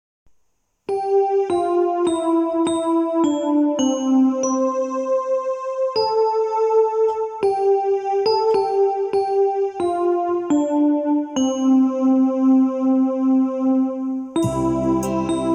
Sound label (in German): E-Orgel; Süßer die Glocken nie Klingen als zur Weihnachtszeit